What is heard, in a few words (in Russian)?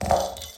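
звук, который можно использовать для имитации разрушения чего-либо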